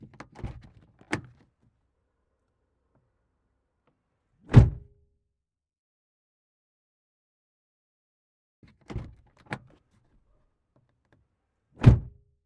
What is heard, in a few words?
Car Door Open Close Interior Perspective